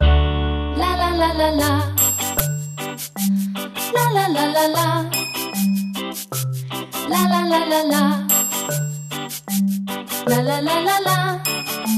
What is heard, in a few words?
Jingle; T-Mobile